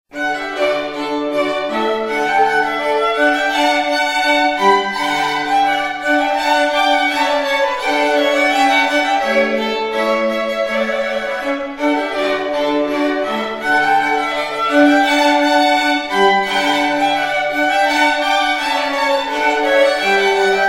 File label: Rondeau, classical string quartet; Mouret; Montani Music Ensemble